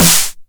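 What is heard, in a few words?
909 SD Tape, snare drum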